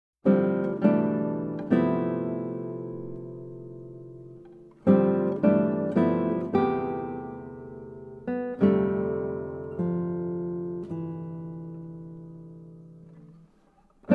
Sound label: Chorale